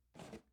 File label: plastic ice chest